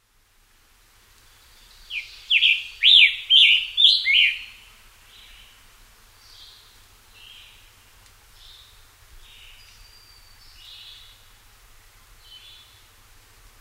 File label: scarlet tanager